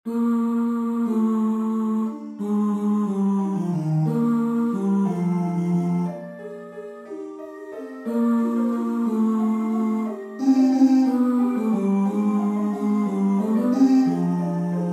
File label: sanctus basses; haydn